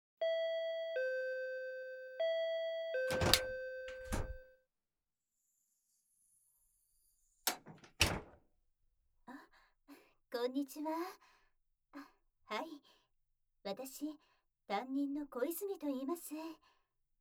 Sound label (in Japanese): 耳舐め 手コキ, ASMR; 勇気が出るおまじない